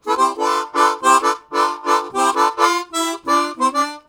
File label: Minor Organ, Harmonica; Zero G